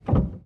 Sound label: piano release